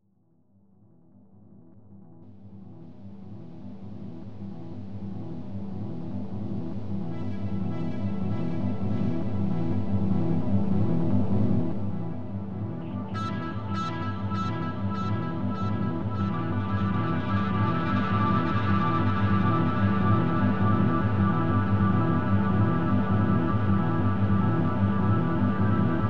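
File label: Noise Keys Drums instrumental song idea; practice jams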